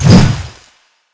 tyrantmace skill

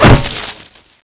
coilhammer hit; Vaas

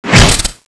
lance attack, weapons

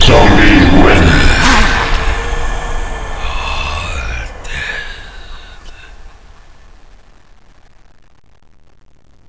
zombie win; csozp

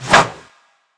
sword bombard fly

